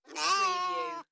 Lamb bleating; beanstalk audio

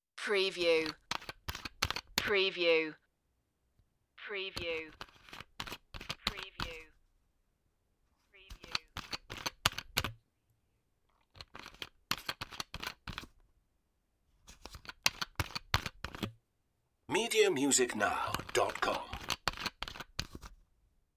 plastic bottle twist

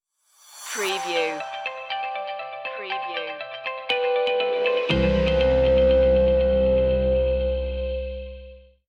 corporate innovations stinger

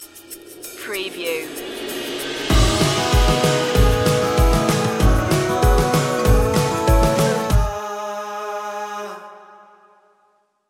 midnight shuffle stinger

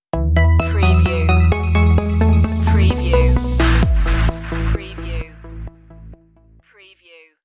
the club stinger

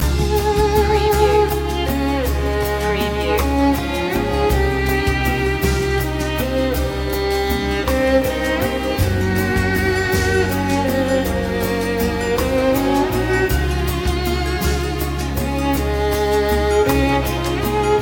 balkan

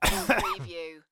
Male Cough; beanstalk audio

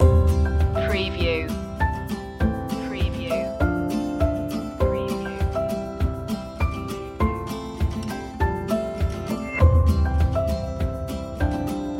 walk together loop

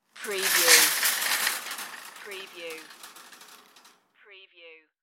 shopping cart

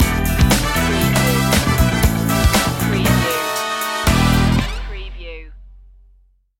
the funky one stinger

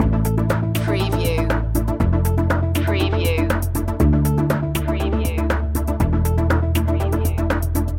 uploading loop

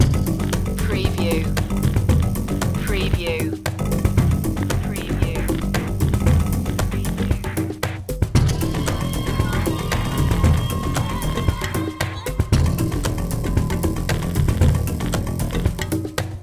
african voodoo loop

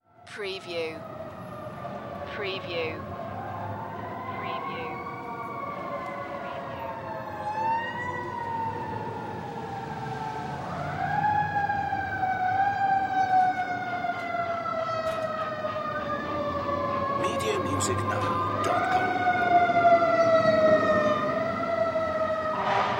Sirens City Street Ambience

